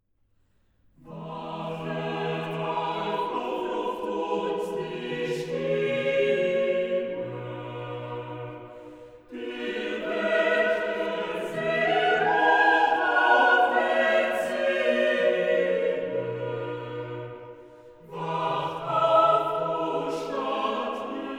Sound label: Chorale, ORGAN; Chorale Preludes; BWV0140; Wachet auf, ruft uns die Stimme; BACH